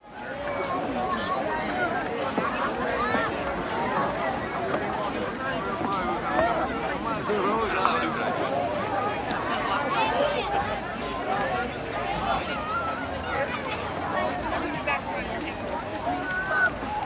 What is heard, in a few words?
Vatican city Walla